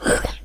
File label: enem crooked hit